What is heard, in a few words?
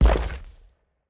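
large step; Smite; Cabrakan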